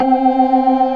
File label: ORGAN, NO PERC